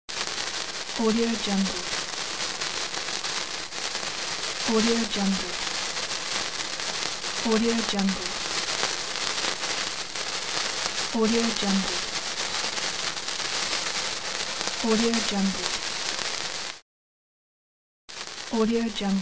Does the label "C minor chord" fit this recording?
No